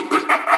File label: huhuhahaha